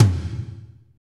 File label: TOM P, Drumscapes Roland; Northstar